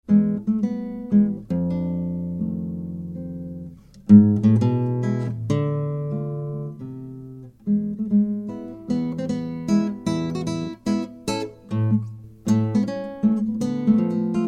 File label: mazurka